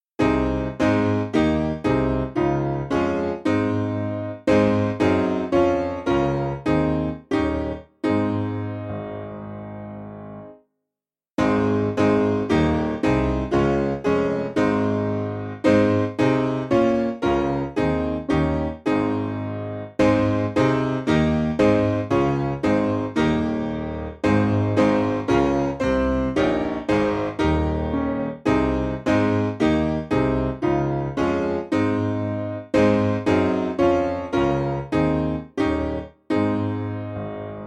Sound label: Piano